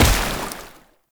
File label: Heavy Step Water, Footsteps; Enemies; Foley; Warframe; Tau Dax